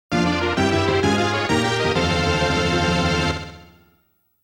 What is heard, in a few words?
Skill Item; Estpolis Biography